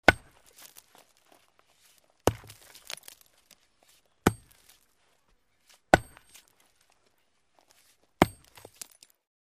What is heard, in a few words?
Sledge Hammer Hit Roc; hammers